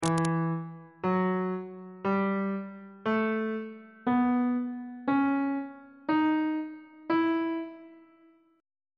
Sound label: d double sharp melodic minor scale on bass clef